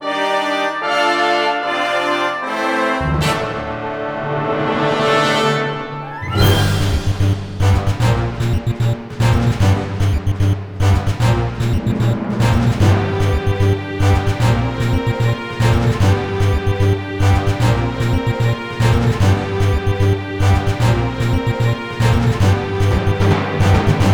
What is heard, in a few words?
Main Theme (With Synth); Metroid Blast